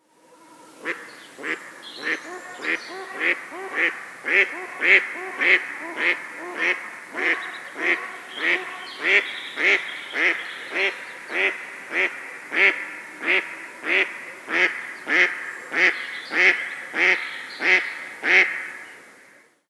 stockente, vogelstimmen

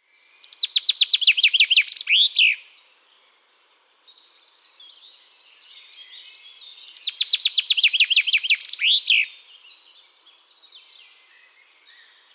Buchfink, Vogelstimmen

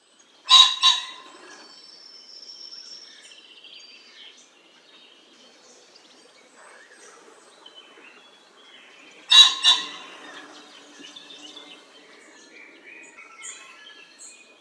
Fasan, Vogelstimmen

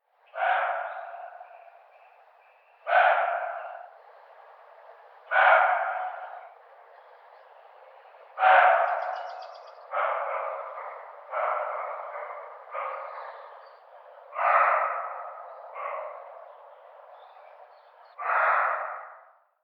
Reh Bock; vogelstimmen

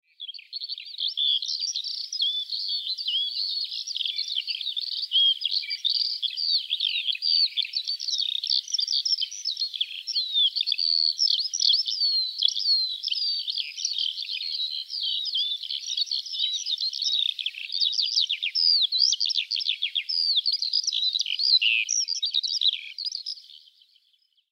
feldlerche, vogelstimmen

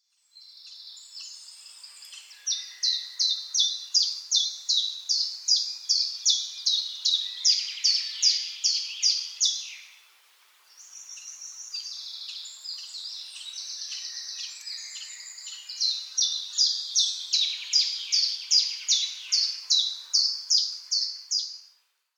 Zilpzalp, Vogelstimmen

